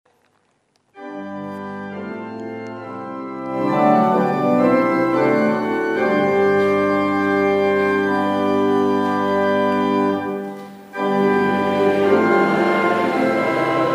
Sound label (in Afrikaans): Gemeentesang; Psalm 139-1 vers 6,12